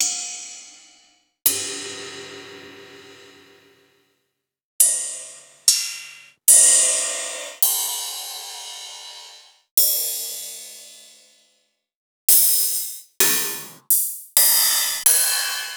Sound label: Rides